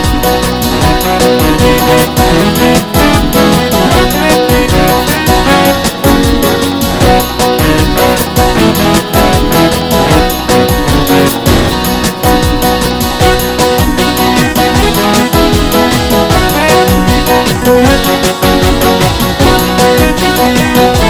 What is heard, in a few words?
Hot and Fast